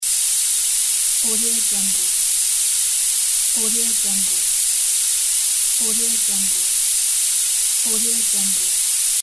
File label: steam